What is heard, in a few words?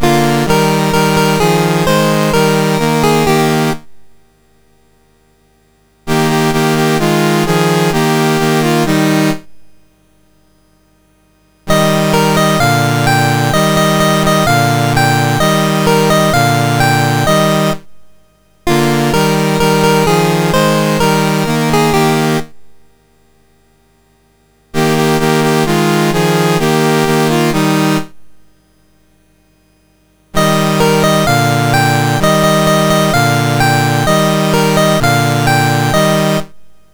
MOS6581R4, National Anthems; Qatar